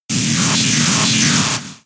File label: Screech; pokemon sfx gen 6 attack moves xy oras